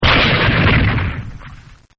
explosion; camp rock; rock n row

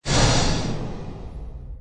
ominous hit; snow white

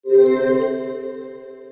disc scan

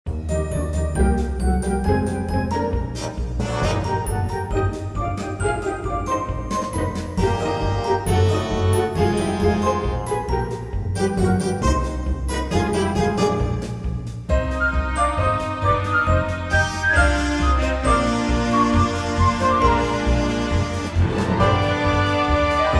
landscape

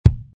kick drum

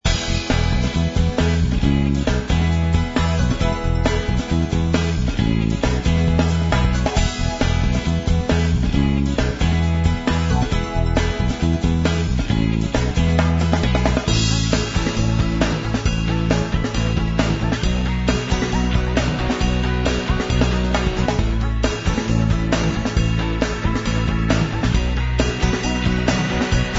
Music; project green; conservation catapult

